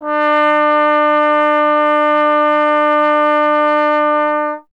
Trombone D; Miroslav Vitous - Solo Instruments VOL-1

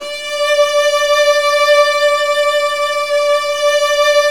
Virtuoso Strings D3; ILIO; Kirk Hunter Virtuoso Strings VOL-2

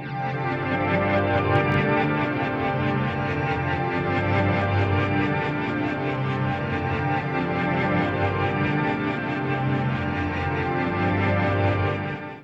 AMBIENT PAD; AMG - Global Trance Mission VOL-2

